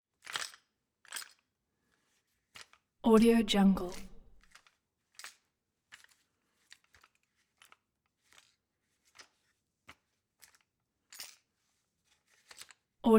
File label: shotgun handle loose metal